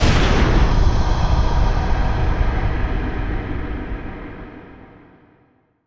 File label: Big Boomer Impact And Swell